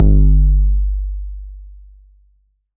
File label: 808 bass drum Tape Sat; Goldbaby Tape808 & Tape909; The Tape 808